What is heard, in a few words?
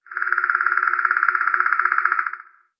click mid